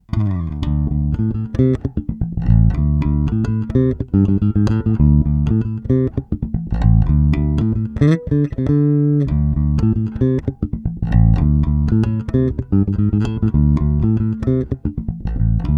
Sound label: Both active neutral consolidated